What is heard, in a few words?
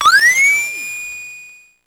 Drum, Electro-Harmonix Spacedrum; Drums